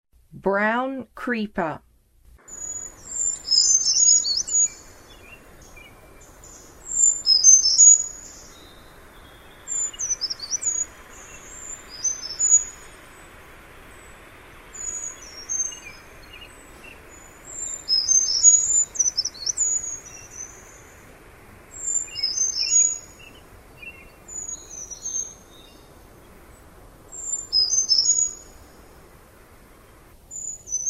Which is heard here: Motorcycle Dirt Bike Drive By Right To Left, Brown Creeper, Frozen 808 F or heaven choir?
Brown Creeper